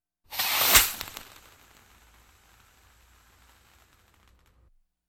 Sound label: Flare Shot